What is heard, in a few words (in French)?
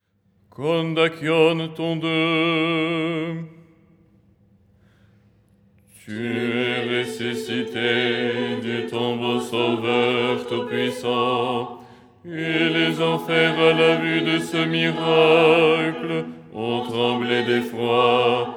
basse; ton2